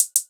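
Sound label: noise, drum machines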